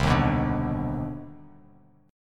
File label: C Minor Major 9th, Bass Standard, CmM9 chord; strummed; JGuitar